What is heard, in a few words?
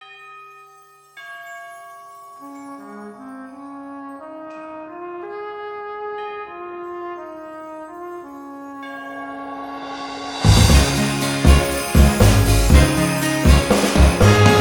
Orchestral Winter Remix; Pokemon Holiday Megamix 2018; Azalea Town; HGSS